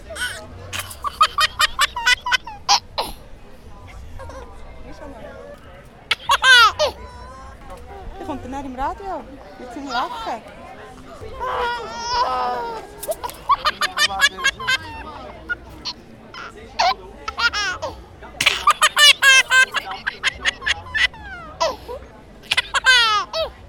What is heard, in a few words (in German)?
Baby Lachen; Lyssbach Märit